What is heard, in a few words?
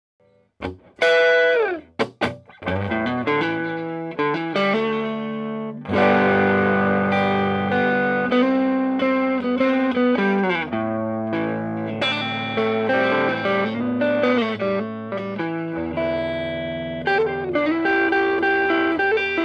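st overdrive; reverb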